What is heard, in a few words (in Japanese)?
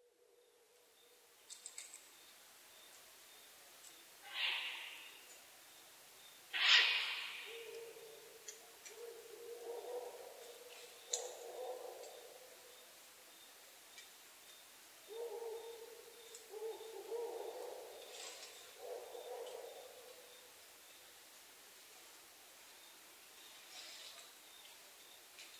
fukurou nigori